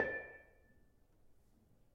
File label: Panerus 6 A, gamelan tuned; gravityharps